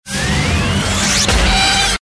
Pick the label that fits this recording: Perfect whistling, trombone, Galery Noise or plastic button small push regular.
Galery Noise